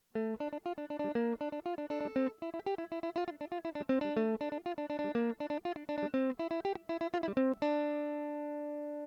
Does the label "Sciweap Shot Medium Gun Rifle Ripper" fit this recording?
No